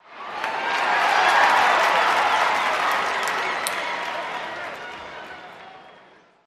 Crowd Int Cheer Small, baseball

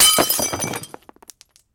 Glass Break On Wood; bottles

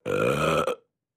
Airy Belch; belching

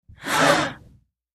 Gasp Sml Crowd Surpri

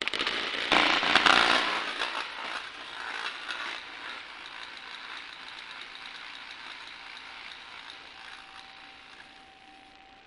Electric Arc At Power Plant, High Pitch; arcs & sparks

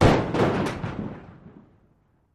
4 Oz Concussion Hit M

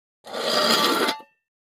Coffee Can Scrape On; barrels buckets & cans

